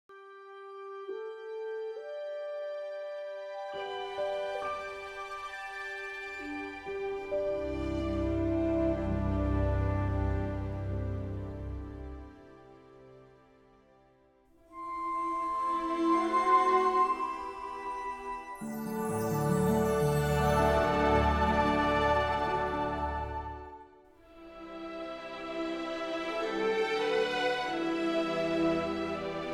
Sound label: Music Only; Staatsloterij; Frekkel 60